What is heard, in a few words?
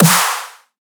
CHIME snare; hench